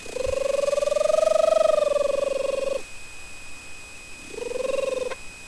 chitter

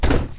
door

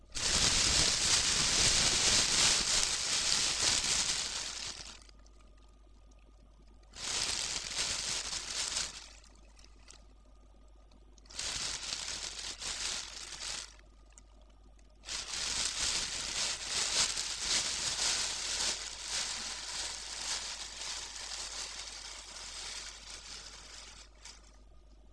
bag rustle

